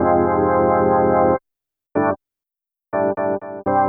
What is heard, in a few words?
Organ, Trip-Hop Loops; Sonic Foundry; Rondo Brothers Trip-Hop Loops & Samples